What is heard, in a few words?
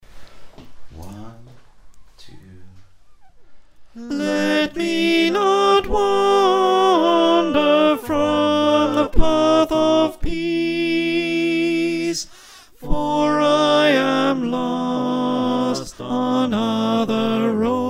alto, choir